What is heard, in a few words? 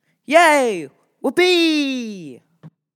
Happy Noises